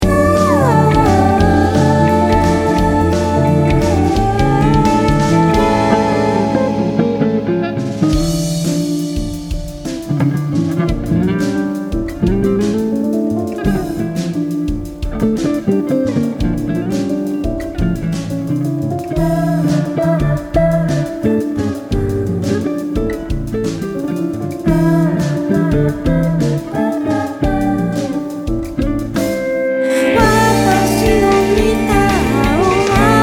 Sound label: octave Guitar; wagamama